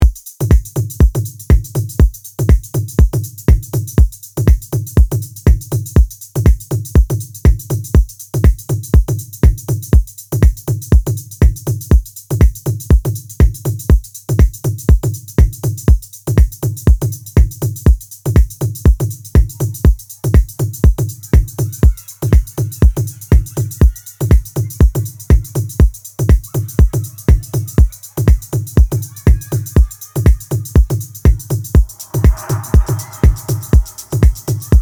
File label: Afro Tech Mix